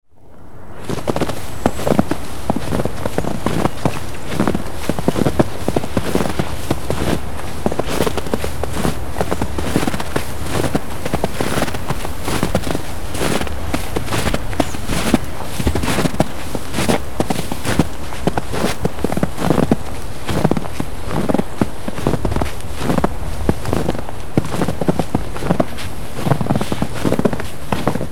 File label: Walking in Snow